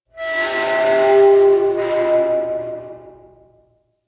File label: Settlement Metal Screech; Ambience; Warframe; Grineer Settlement